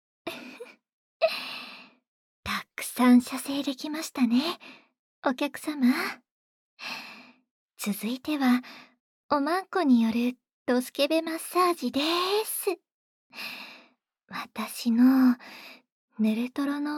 顔面ぬれぬれマッサージ, ASMR; おまけ音源

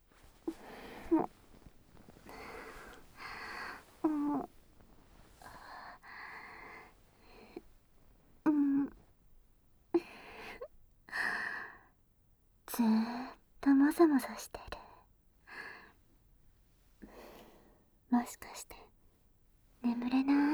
ドスケベ添い寝→耳舐め寝かしつけ, ASMR

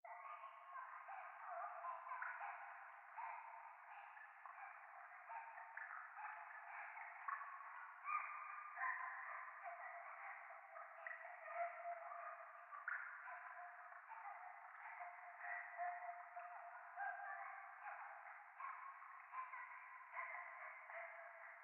廃墟 可愛い喘ぎ 雨上がり

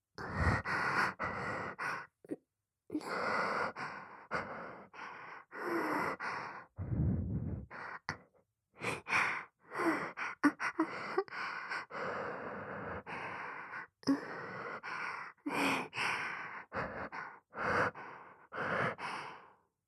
喘ぎ声_左耳

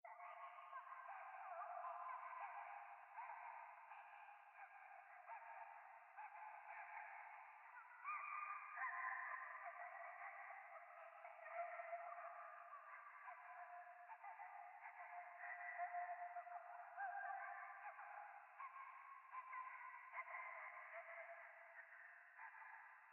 ゴースト 可愛い喘ぎ 山奥夜

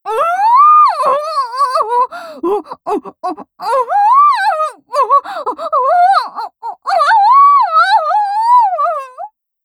喘ぎオホ最大

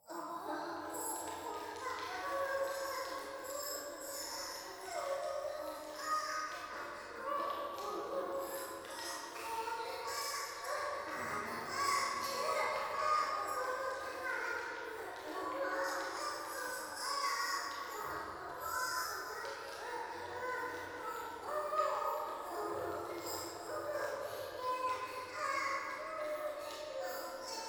教会 低音喘ぎ 暖炉

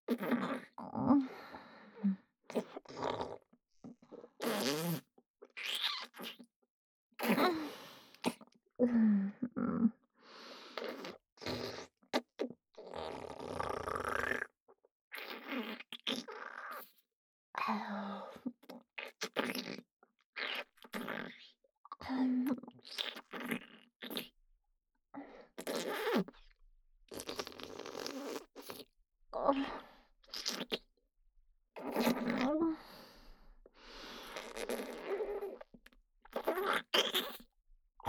妖艶 ねっとり口フェラ, ASMR; フェラ素材

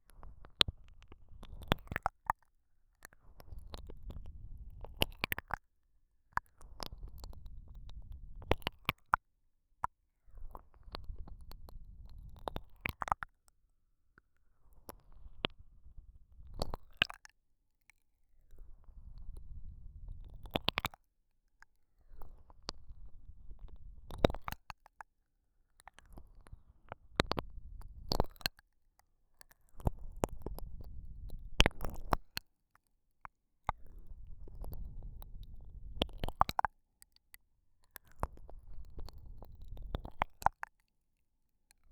黒耳 右耳 抜き差し; Free Space ProII

